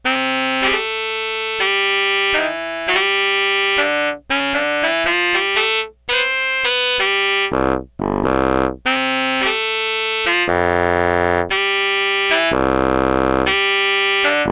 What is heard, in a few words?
ROUGH TENOR SAX